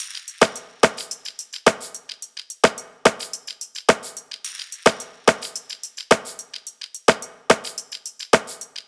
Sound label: top loop, Drums, Loops, Top Loops, Pop; highland 108; Slate; SD PASADENA Pop Sample Pack